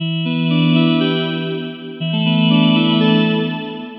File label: Dine Pad, Synth Textures; Sonic Foundry (Sony Creative Software); The Electronic Music Manuscript Collection